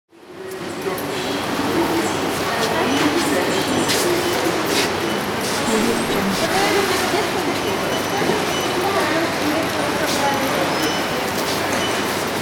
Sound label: shopping ambience; magicsoundflower